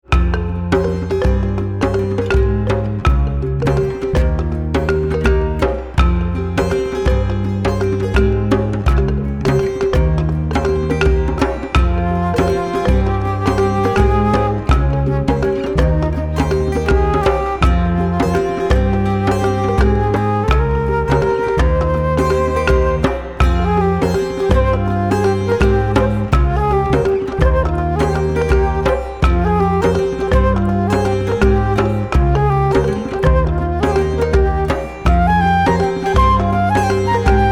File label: Gavotte Balafon